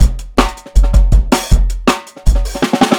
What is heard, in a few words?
160 live jungle, breaks